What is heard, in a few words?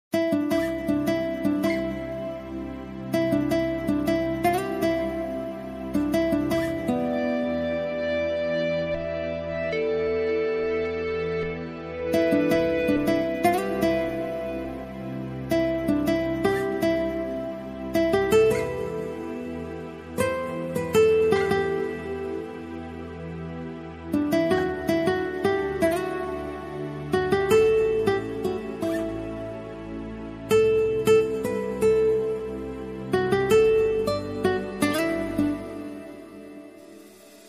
sounds of guitar